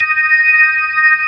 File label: B3 Hi Slow D#3; Proteus 2000; Digital Sound Factory Proteus 2000